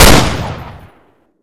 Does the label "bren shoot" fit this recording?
Yes